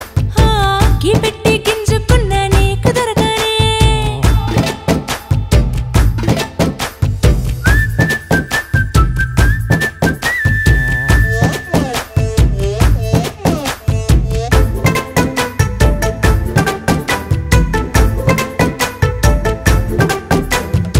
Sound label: RINGTONE, TELUGU SONG